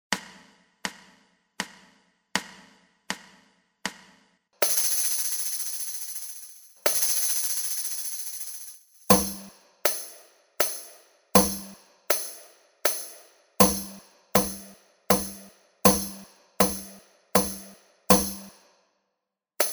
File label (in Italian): esercizio ritmo composto